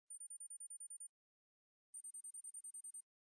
silent ringtone

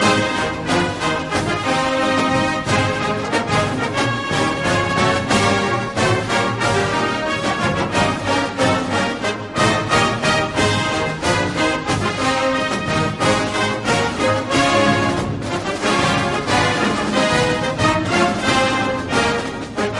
fight song; ohio state

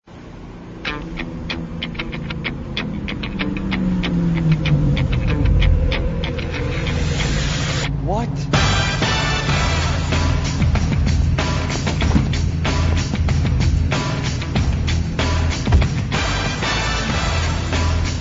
Camaro ringtone; Bumblebee

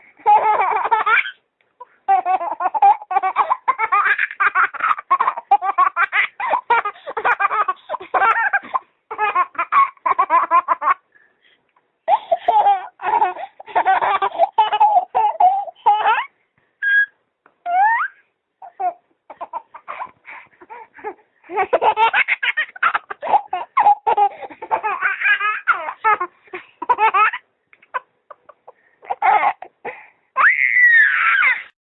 baby laughing

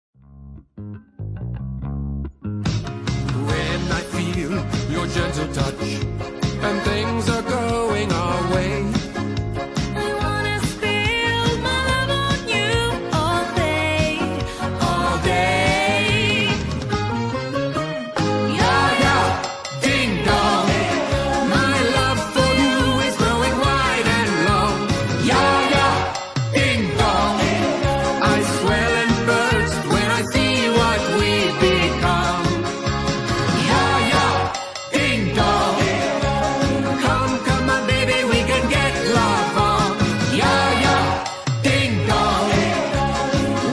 ding dong; jaja

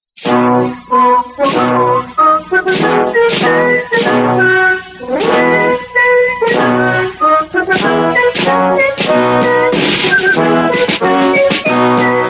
old spice song, ringtone

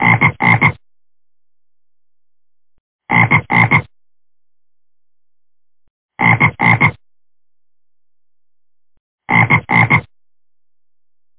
frog

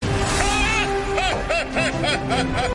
laugh; all might

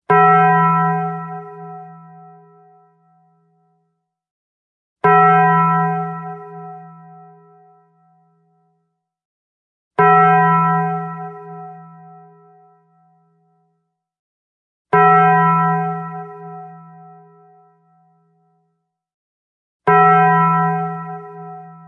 bong; taco bell

